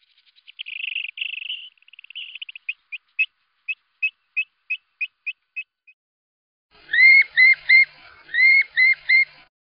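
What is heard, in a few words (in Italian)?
Piviere Tortolino charadrius morinellus; Versi Animali; Il Forum della Fotografia Naturalistica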